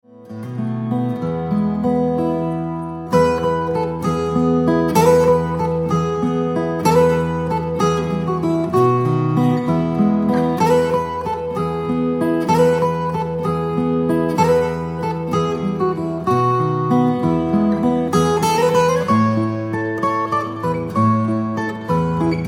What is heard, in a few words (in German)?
Instrumental